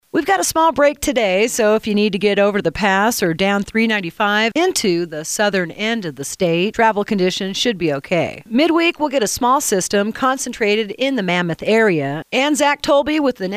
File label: stormdoor rap